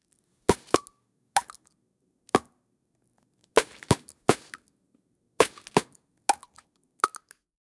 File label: mario jump, video game